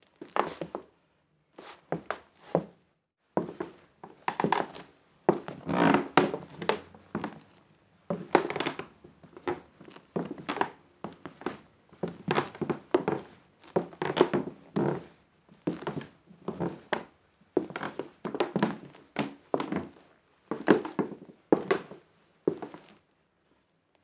Footsteps Wood; HauntedBloodlines; SFX Producer

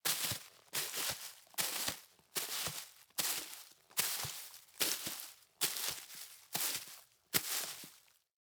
Footsteps Leaves; Haunted Bloodlines; SFX Producer

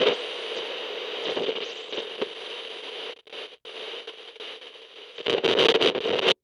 CB Radio Static

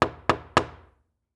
Door Knock Door; MinorHallutinationsSounds; SFX Producer

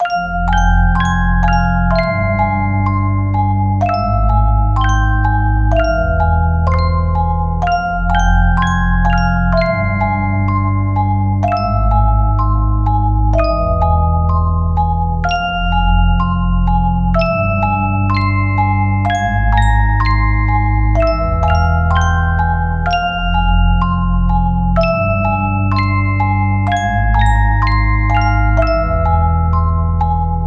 Horror Musicbox Main Melody, Loop

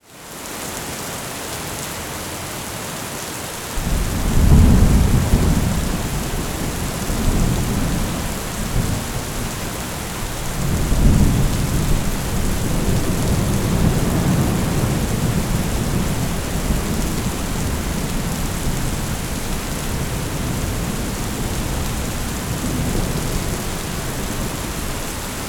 Thunderstorm Rain; HauntedBloodlines; SFX Producer

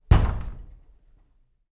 Door Bang Rattle; MinorHallutinationsSounds1

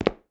Footsteps Cement; HauntedBloodlines; SFX Producer

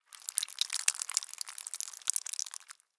Blood Spill Drips; HauntedBloodlines; SFX Producer

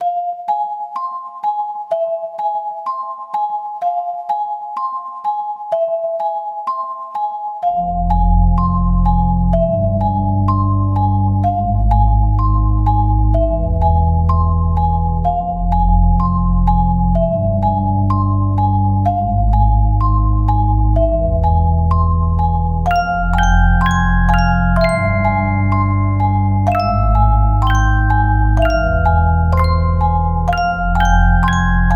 Horror Musicbox

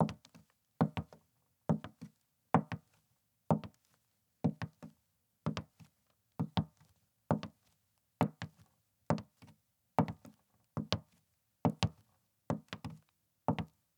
High Heels; Footsteps; HauntedBloodlines

